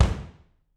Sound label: BD, Drums; Bob Clearmountain Drums I